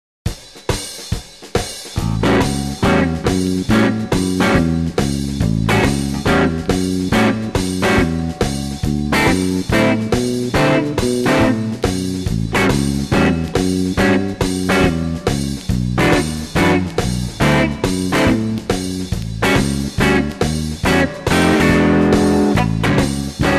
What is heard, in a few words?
Rhythm Guitar